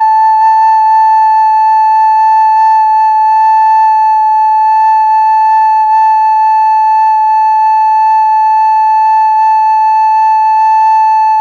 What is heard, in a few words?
flute; lmms